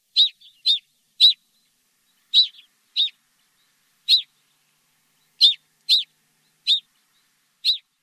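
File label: Black Rosy-Finch calls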